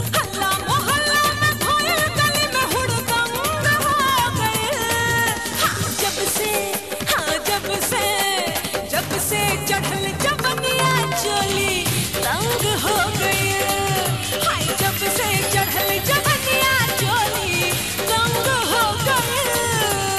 BHOJPURI RINGTONE

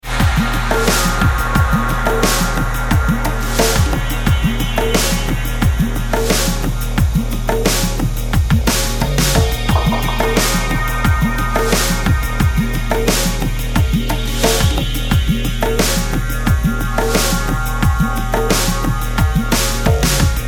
ambient inner touch ringtone

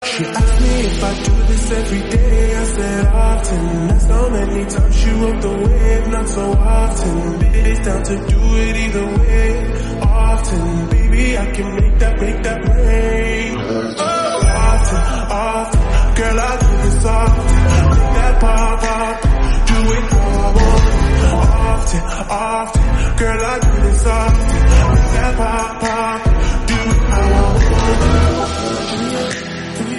LOFI RINGTONE

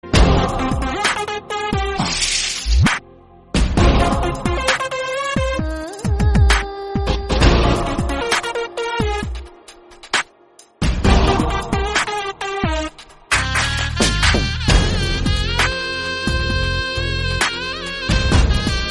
INSTRUMENTAL RINGTONE

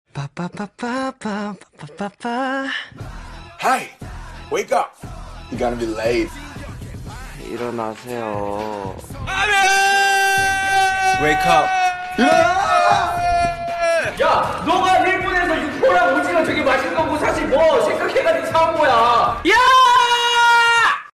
ALARM RINGTONE

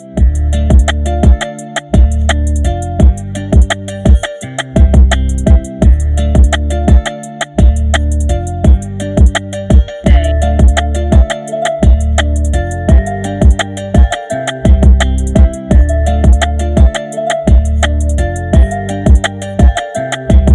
INSTRUMENTAL RINGTONE

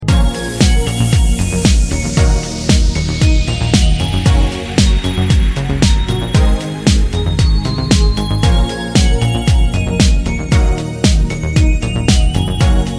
instrumental ringtone

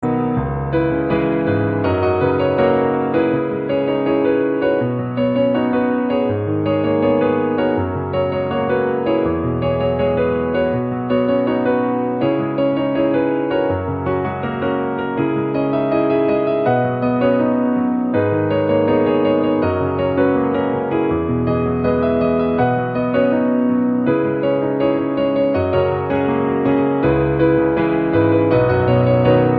PIANO RINGTONE

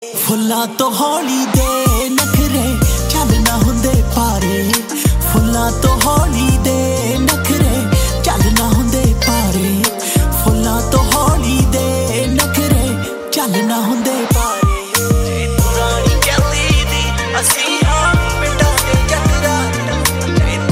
PUNJABI SONG RINGTONE

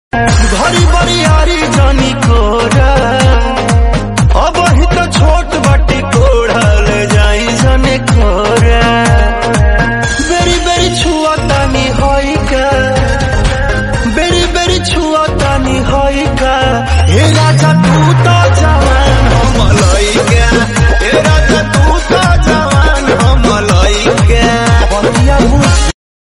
BHOJPURI RINGTONE

